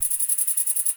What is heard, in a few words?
Forest Animal Close; Ambience